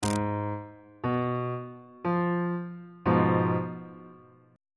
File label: e sharp diminished 1st inversion on bass clef